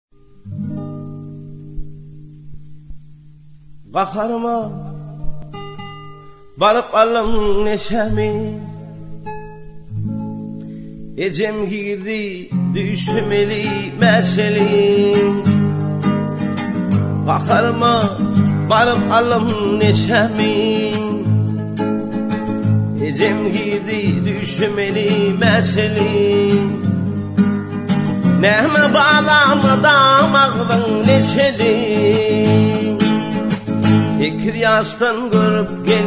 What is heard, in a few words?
turkmen gitara